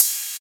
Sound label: Ride, Rides; Electronisounds